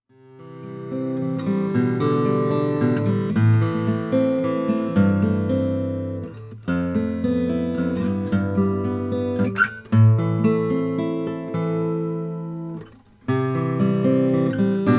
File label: flammang l35 13 fret sunburst